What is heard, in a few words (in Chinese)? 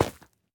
菌破坏声